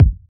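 urban steel kick drum g key, bass drum tuned to the G note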